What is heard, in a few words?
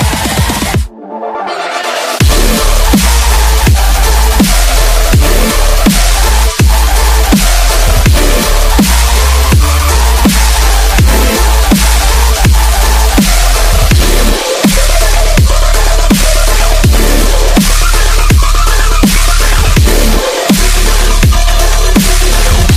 dubstep